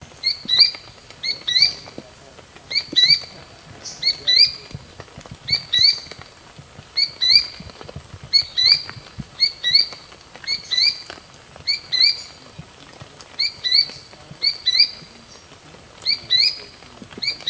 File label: Azara's Spinetail